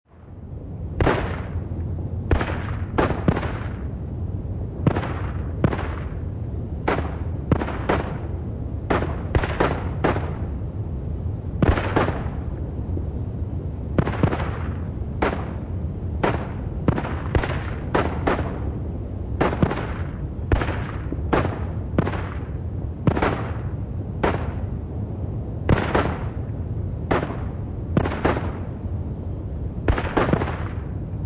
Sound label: Warfare World War One Battlefield Distant Fighting Light Wind